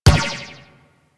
Ball Bounce Default; Warframe; Missions; Tenno Ball

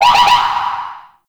Space Ship Rocket Projectile Loop; Warframe; Grineer Pursuit Space Ship

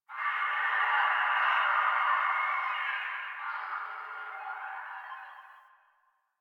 Distant Infested Screams Winter; Ambience; Warframe; NineteenNinetyNine

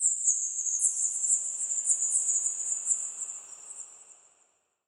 Under City Bat Chatter Distant; Ambience; Dungeons; One Offs

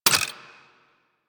Bubblegun Pistol Mech; Warframe; Weapons; Tenno

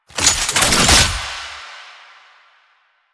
Gunbrella Reload, Weapons; Warframe; Tenno; Koumei

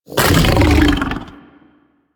Predasite Vocal Attack Short; Warframe; Hunting Critters

